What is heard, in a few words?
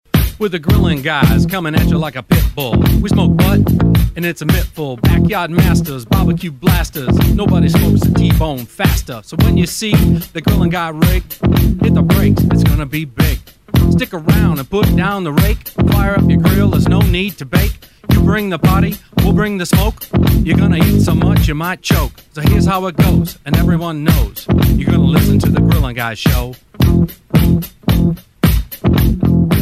Rap Ringtone